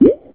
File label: drop; Water Drop; MiniSpeak Special FX Sound Files